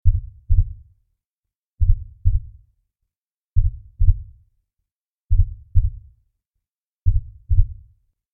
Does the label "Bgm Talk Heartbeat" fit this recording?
Yes